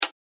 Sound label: Closed Hi hat